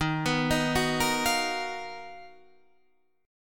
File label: B Major Sharp 11th D sharp